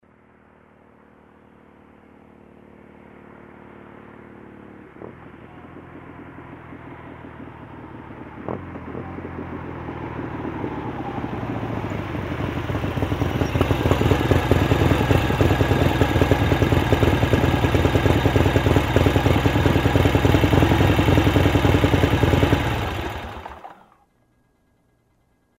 Harley-Davidson motorcycle approaching, waiting, turning off